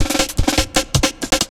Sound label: Fill, Drum N Bass Percussion Fills n Breaks